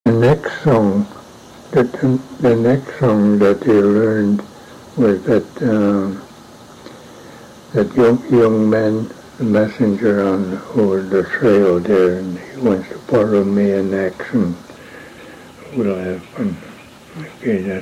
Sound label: Song